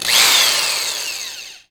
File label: drill